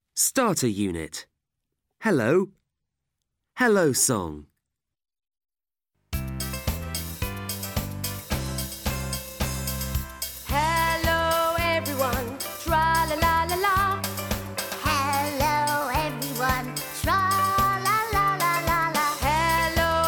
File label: Hello song; Oxford